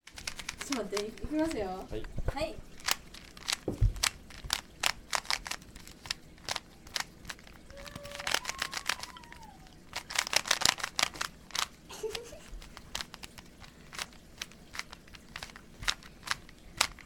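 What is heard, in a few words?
Rubik's cube; 復刻？ルービックキュ〜ブ; Chino Cultural Complex; 茅野市民館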